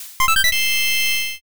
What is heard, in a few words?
all clear